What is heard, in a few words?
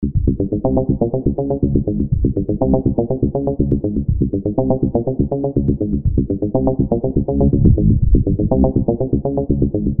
bass; fire by music sound software